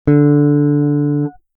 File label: Squier telecaster D string